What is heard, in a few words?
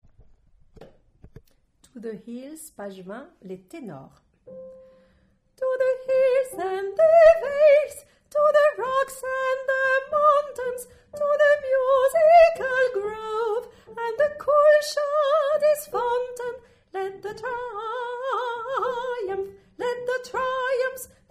tenor